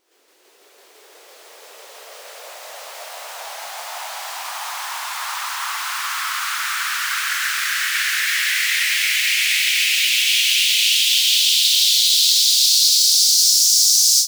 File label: FX Uplifter